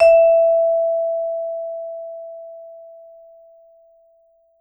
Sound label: E4, Vibes; Sampleheads - Dave Samuels Marimba & Vibes